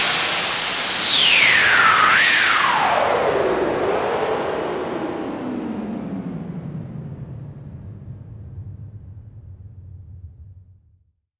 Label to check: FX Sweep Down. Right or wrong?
Right